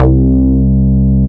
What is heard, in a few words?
round, Synth